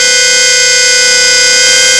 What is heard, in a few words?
pipe BC